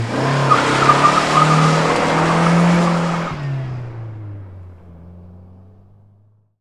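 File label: burning rubber, Car Skids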